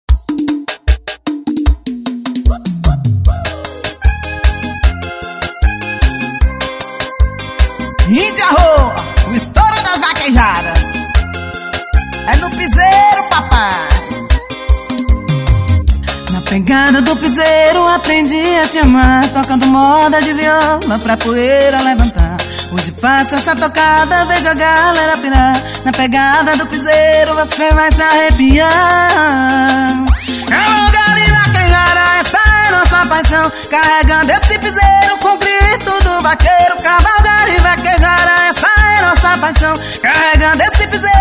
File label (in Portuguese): grito do vaqueiro